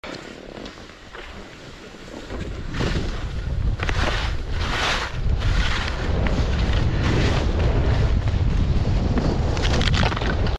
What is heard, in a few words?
Snow skiing; اسکی رو برف